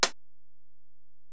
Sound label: HiHat, Alesis DM-PRO